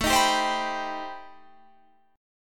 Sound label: C Minor Major 7th A